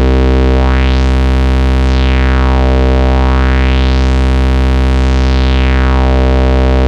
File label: E-MU MOD 2 C2, E-MU modular; Greytsounds